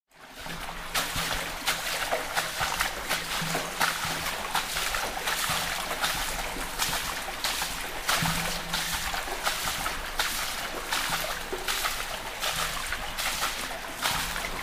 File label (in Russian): zvuk luzhi